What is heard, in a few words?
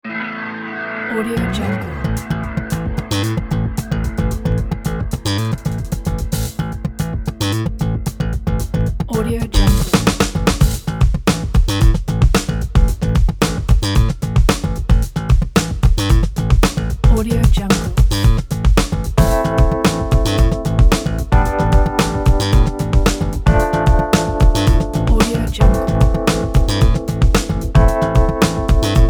tragedy groove